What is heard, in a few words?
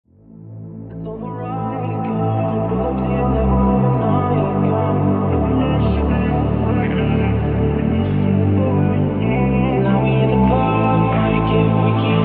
wave phonk